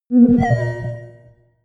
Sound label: notification sci fi accept; kommunikation; signale